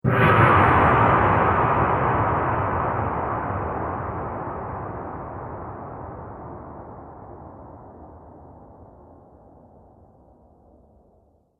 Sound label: Epic Impact; Miscellaneous Impact Sound Effects